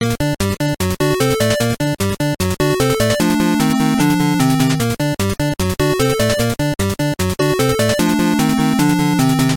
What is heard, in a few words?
Continue; Cobra Triangle